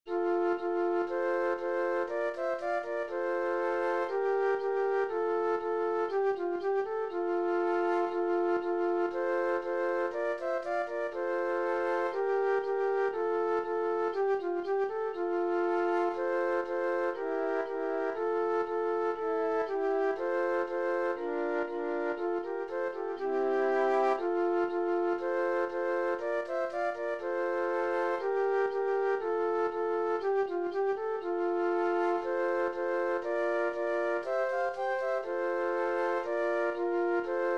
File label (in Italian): PICCOLA CANZONE TRIO FLUTE